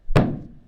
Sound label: reptilian tail thump; Voice